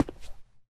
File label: concrete, Footsteps; zps; Foley